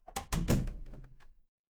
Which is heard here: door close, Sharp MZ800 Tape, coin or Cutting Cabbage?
door close